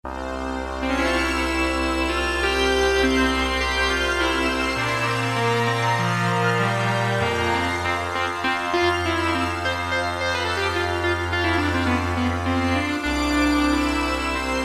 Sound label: High-Strings Oboe; Roland PN-D50-03